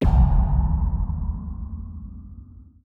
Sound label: sfx sci fi; Masterbits - Switch FX